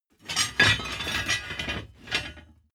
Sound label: plate armor put down